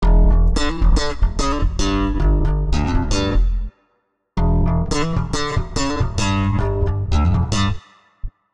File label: Warped Bass, 110 C; Loops; Slap Mountain; Touch Loops; CosmicFunk